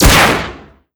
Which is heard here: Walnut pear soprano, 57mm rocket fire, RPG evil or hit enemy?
57mm rocket fire